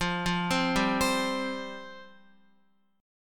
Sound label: F Suspended 2nd F Guitar; Fsus2 arpeggiated; JGuitar